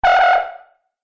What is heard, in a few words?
Multimedia Error, beep; Business Programs, Apps And Computing Noises; Andrew Fleming